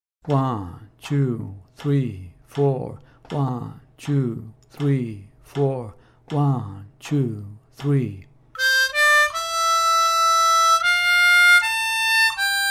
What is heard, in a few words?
80 bpm, harmonica; Home Sweet Home